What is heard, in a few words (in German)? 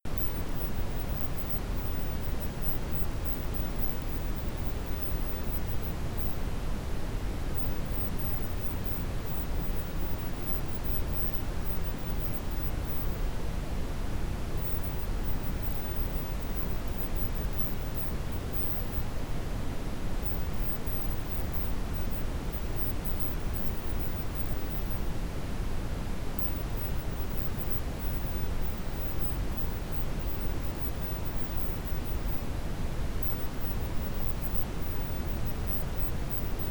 Rauschwelten Braunes Rauschen; noise; Silentsubs